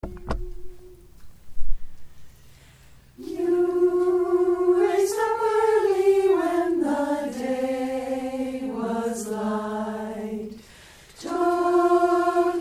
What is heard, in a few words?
Melody and Harmony, choir